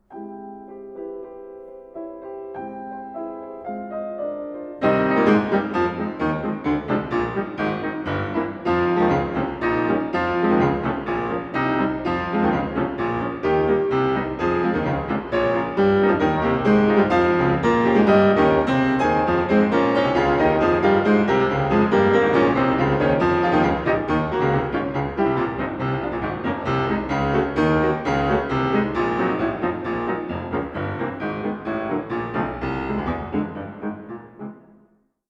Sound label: Etude Tableau f-moll Allegro non troppo